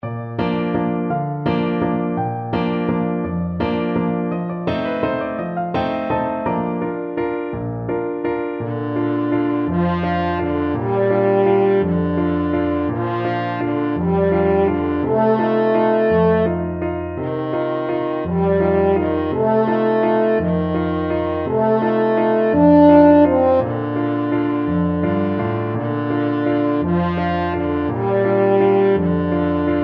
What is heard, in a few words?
french horn